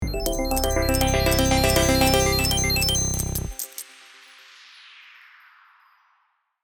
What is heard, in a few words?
Slot machine casino big reveal reel start